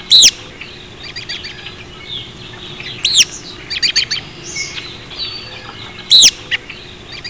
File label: robin